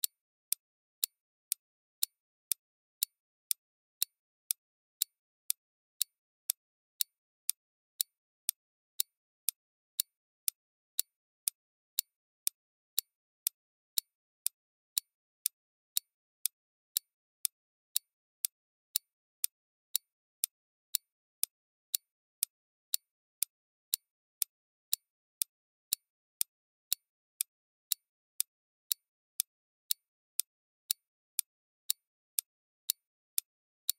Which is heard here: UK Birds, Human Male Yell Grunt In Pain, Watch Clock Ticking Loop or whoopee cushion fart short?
Watch Clock Ticking Loop